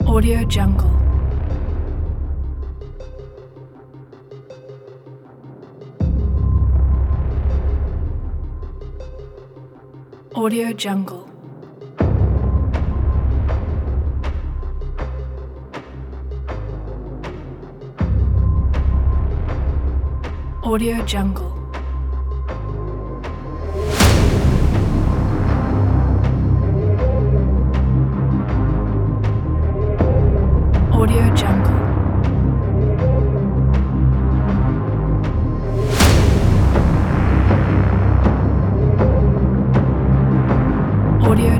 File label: mysterious scifi cinematic